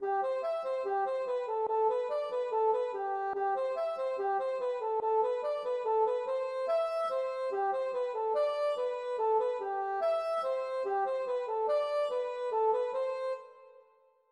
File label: polka Piquee